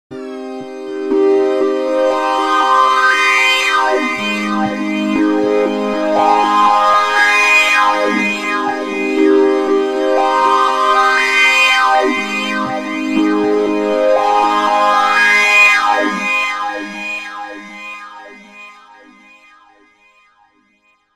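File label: Rhythm Pad, 128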